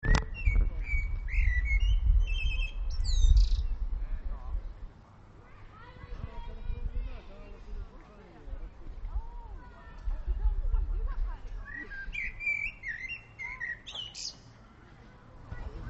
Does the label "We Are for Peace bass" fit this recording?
No